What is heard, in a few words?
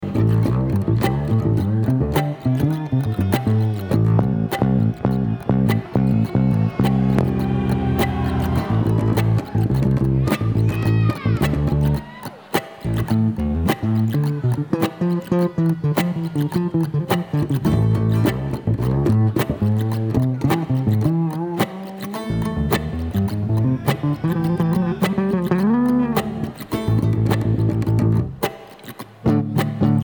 Traffic Jam